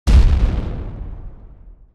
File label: Explosion Far; Adobe; after effect; Action Essentials 2; Bonus Sound FX